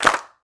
original hand clap sample d key, Royality free clap sound tuned to the D note; single hits one shots